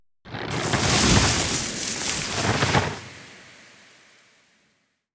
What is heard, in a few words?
Treant Poison Spell